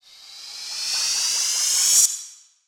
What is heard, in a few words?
Reverse Fx; musicradar